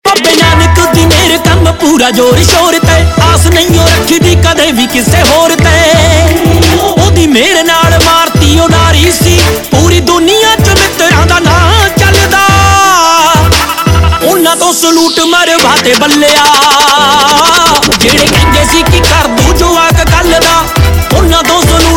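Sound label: Ringtone, Punjabi Pop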